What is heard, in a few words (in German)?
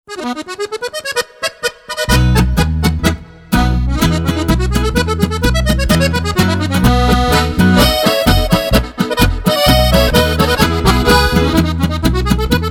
Akkordeon, Polka